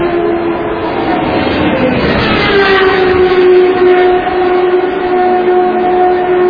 gonochnij bolid poshel na obgon

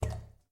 voda kaplya priglushennij udar

